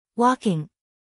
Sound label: walking